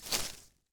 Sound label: Footstep Grass Medium; Footsteps